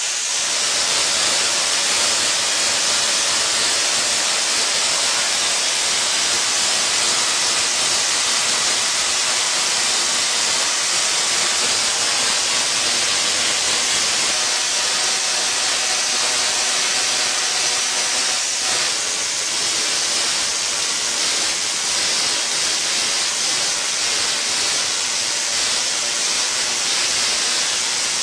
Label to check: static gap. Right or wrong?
Right